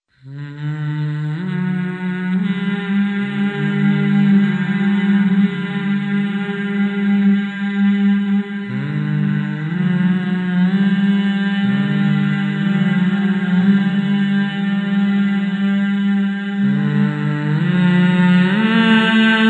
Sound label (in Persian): Vocal Shoor